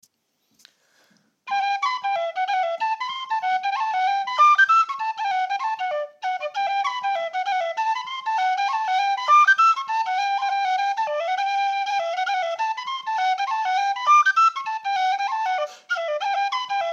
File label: hornpipe; murphy's